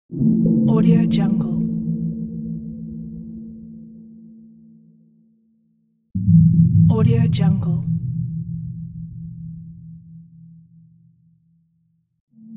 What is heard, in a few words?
alarm ambient sounds